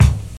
thumpy kickdrum c sharp key, loudest frequency: 436Hz